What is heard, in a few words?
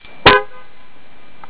horn, car